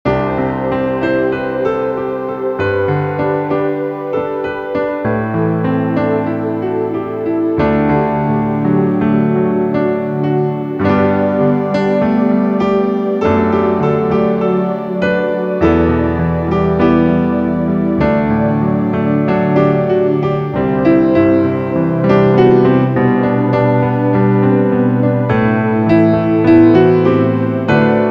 pianoforte; Floralia

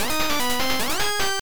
Miss; solomon s key nes

